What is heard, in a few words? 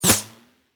bullet flyby rifle close; Rust